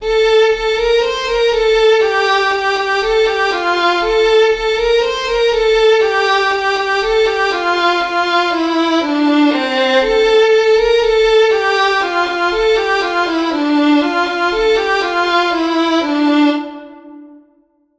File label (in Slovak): Vodená tempo 120